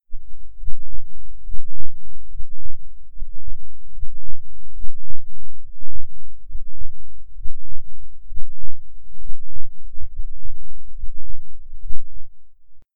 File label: heartbeat